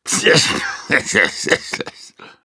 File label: Soldier laughshort, ru; Короткий смешок 2